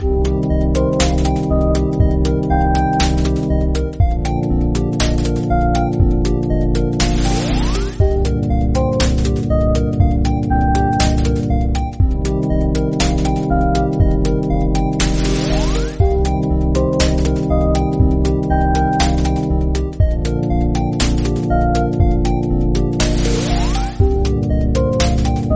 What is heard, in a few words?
boss dragon eye loop